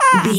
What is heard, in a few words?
voice funny cartoon misc